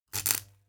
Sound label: zap, energy; ambient; css